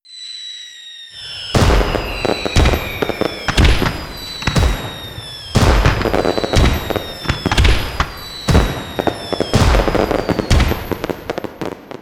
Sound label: Result Fireworks; Pac-Man Championship Edition 2 Plus